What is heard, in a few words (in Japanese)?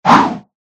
ムチをふるう 通常攻撃; モノラル; 武器効果音